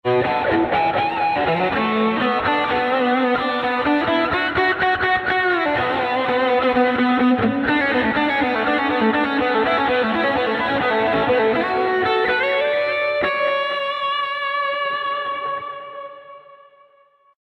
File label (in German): Lead Quinte runter; RiffStation